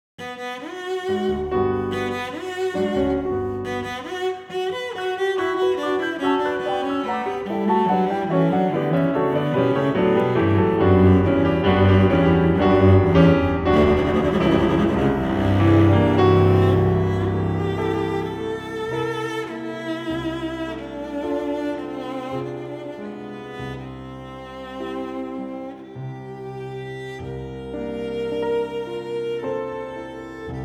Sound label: Folklore Trailor